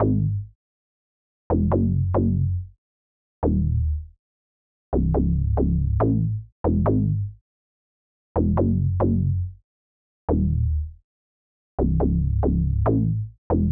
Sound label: 140 BPM C# Min ARP2600 Bass; Stems; Cymatics; Kaleidoscope